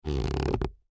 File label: Cardboard Package Creak; Materials; Foley; Blastwave